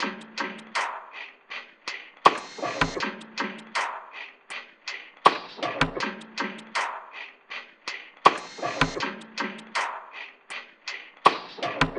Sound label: Snow Perc Loop - 160 BPM; Cymatics; Fiji Hihat Loops & Percussion